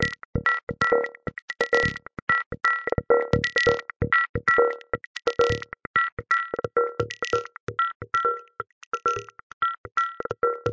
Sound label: 131 rollertechno explore